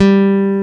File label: PICK G2 AG, GUITAR; AKAI S-Series CD-ROM Sound Library VOL-8